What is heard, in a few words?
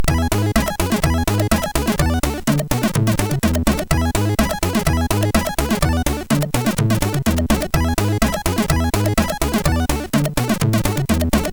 Intro Ska, MOS 6581